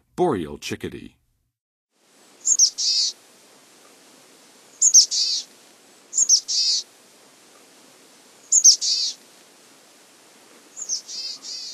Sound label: Boreal Chickadee, Songs of Birds; North America; Western USA; Western USA Songbirds